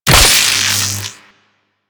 Beam Hit Effect; Enemies; Warframe; Captain Vor

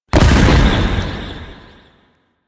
Wraith Leap Jump Land; Enemies; Warframe; Zariman

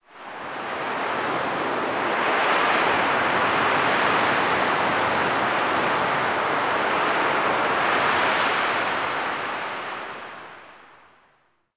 Sandy Wind; Warframe; Man In The Wall

